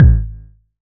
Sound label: big kick single hit g sharp key